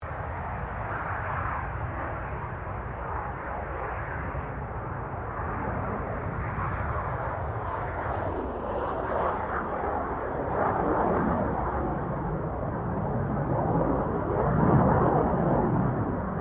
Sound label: AIRCRAFT CA-27 SABRE TAKEOFF DISTANT; CA-27/F-86 Sabre; Airplanes